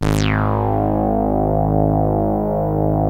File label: Saw, Synth; MISC-SYNTH